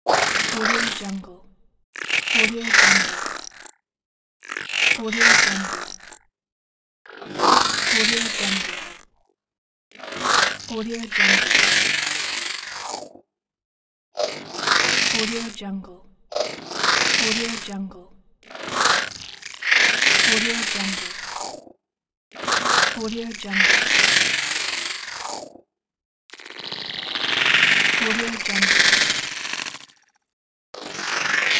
futuristic alien

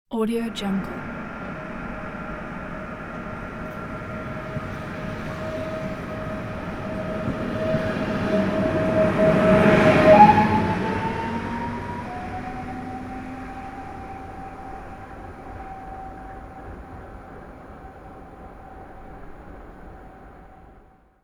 fast train departure; fxprosound

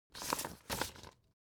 foley paper old examine; smartsoundfx

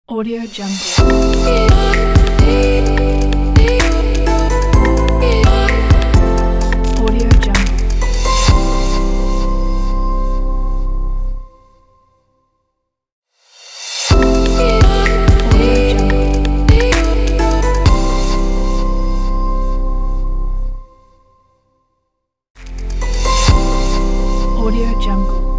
brilliant logo

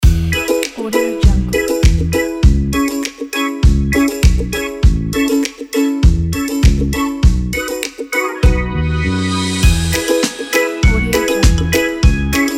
happy reggae for children

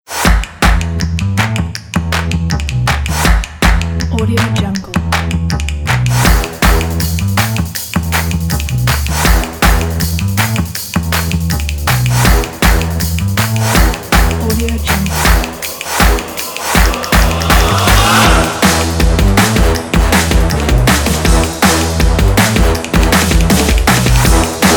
stomp and clap action trailer

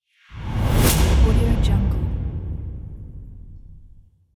cinematic transition; music-fish

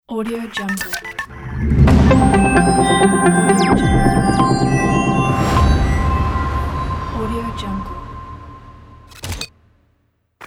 digital logo

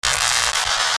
arcing static; sound ideas

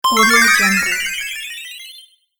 fun gaming app magic vanish

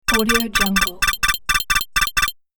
alert effect; sonic-boom

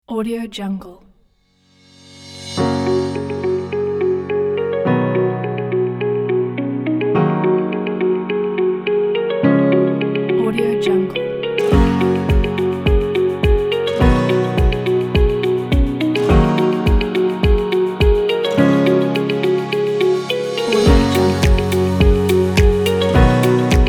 inspiring corporate background spring motivation

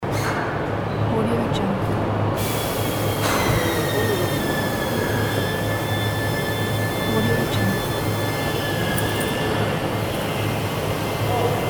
depot interior loop

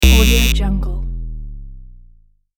sub bass buzzer

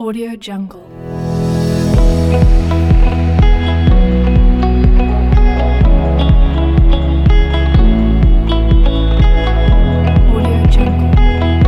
inspired background business corporate

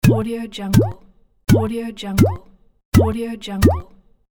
bubble popups

